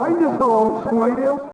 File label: walla; DAoC; Toa; Gamestar